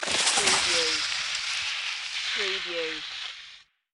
Skiing, Snowboarding, Slide and come to a stop, distant